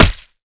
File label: hit, knuk; svencoop; ins2